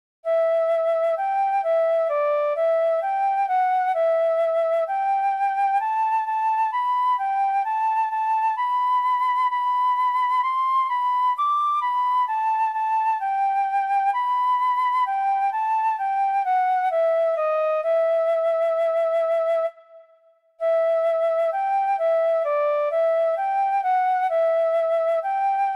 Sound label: Jah keep us steadfast in thy Word - FLUTE